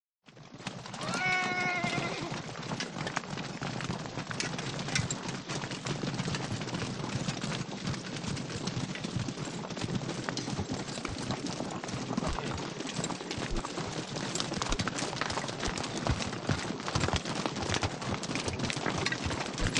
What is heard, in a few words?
Horses Constant Past